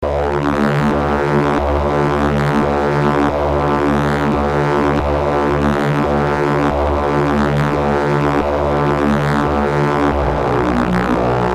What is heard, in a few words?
Mouth Harp; Anamono